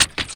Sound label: LOOP L, Drum & Bass 160 BPM